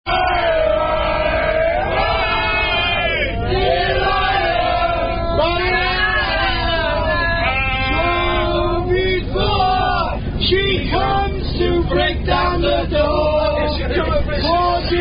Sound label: Dragon Song, Unknown Rugby Song